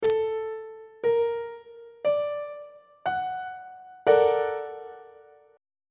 b flat augmented major 7th 3rd inversion on treble clef